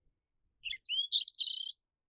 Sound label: Snow Bunting Sound